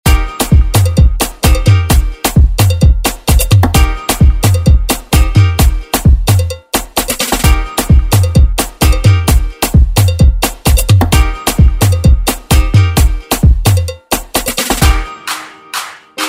Afrobeat Redrum

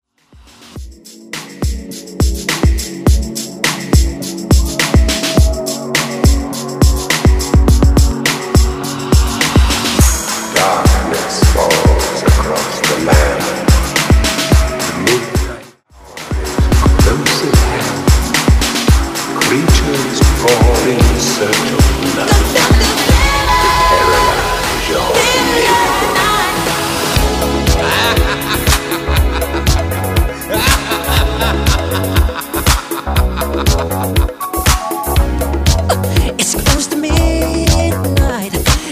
Halloween Transition 104 120

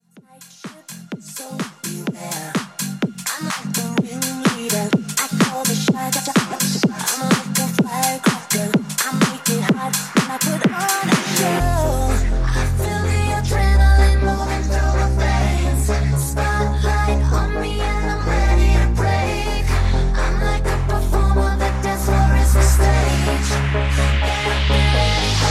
Pop Rebuild; Remixfreakz

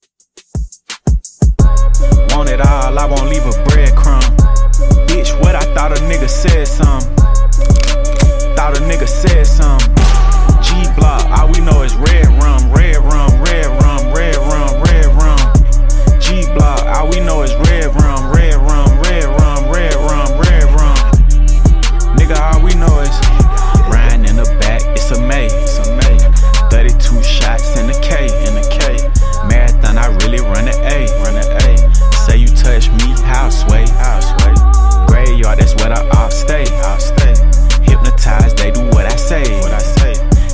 Intro Outro 86Bpm

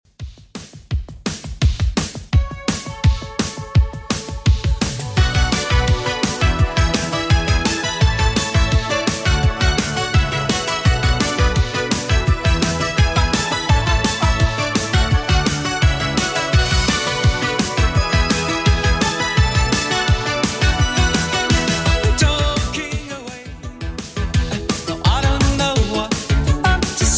80s Redrum 169bpm

